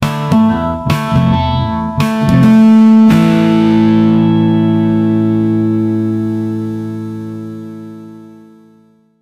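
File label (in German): ein längerer werbe soundtr, advertising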